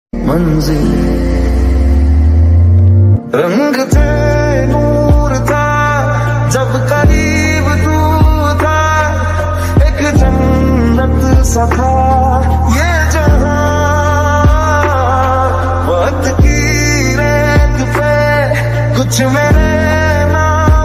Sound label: instrumental ringtone sad song ringtone